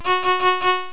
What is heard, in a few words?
ding ding